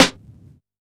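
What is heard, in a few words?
Vinyl Snare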